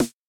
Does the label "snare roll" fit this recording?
Yes